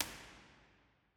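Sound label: impulse response, Lady Chapel St Albans cathedral; surround 5.1; williams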